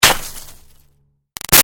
Snow Impact Hard Glassy Ice; Weather; Big Room Sound

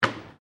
Air Hockey Place Puck, Air Hockey table; Games

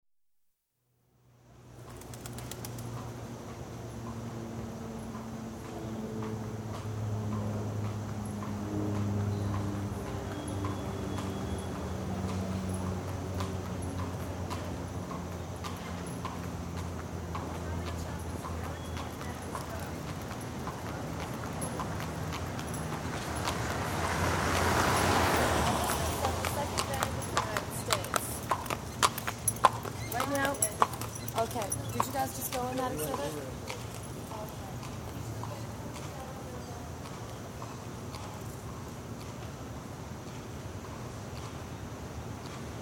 Philadelphia: Traffic with horse & buggy; Traffic And Car; BBC

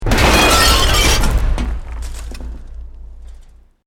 EXPLOSION MEDIUM BRICK WOOD GLASS NO FIRE

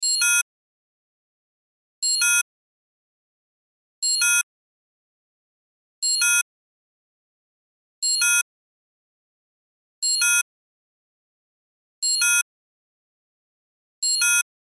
Cell Alarm Low, Cell Alarm Low Battery Chime Loop; Alarms; Electronics; Blastwave

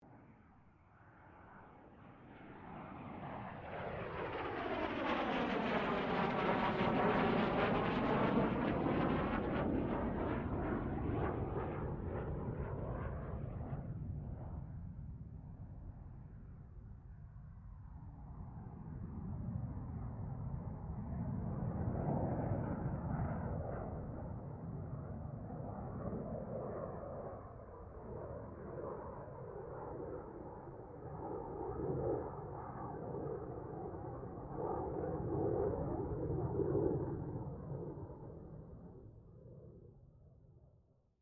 AIRCRAFT CA-27 SABRE PASS STEREO

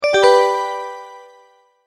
multimedia tone, Goodbye; Tones; Sound Design; McKinney Sound

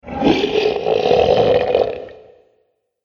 Dragon Carnivore Growl, monster; Monster Sound Effects

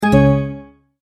multimedia tone error; Tones; Sound Design

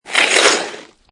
Snow And Ice Ice Slide Pass By; Weather

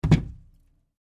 Cardboard box hit

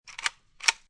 Load, Gun Handling; Guns; VRSonic

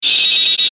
static pulse; Static Pulse Stutter; Production Element Imaging Element Accent Transition; Blastwave; Static Sound Effects

